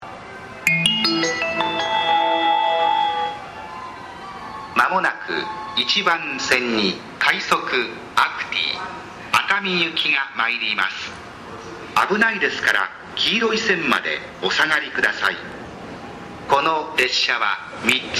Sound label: sekkin; kozu